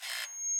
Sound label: Proximity Card Door Lock Unlock